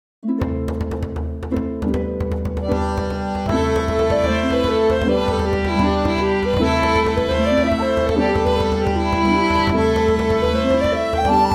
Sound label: Celtic Band song; Some music Mike wrote for a TV documentary about ancient Celtic art